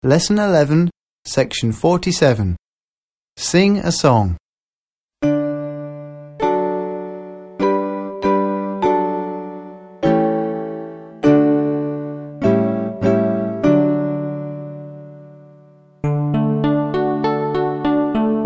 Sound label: song twinkle, twinkle, little star; busy bee 4